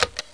push; Dino Crisis; room

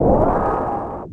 swish; fight

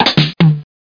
troops; steelfortress30

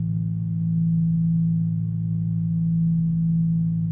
computer hum; Moss

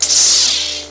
whizbang; oscar lemming

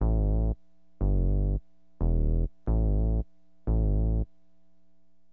BASS, HipHop; LiveAct Pool

